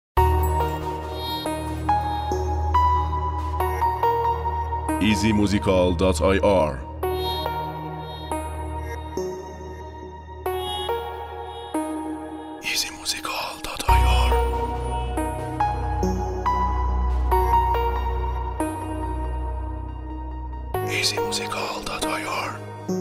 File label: Beat